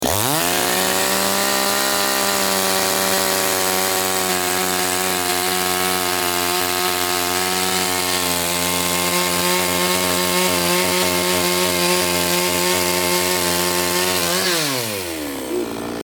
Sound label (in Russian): benzpila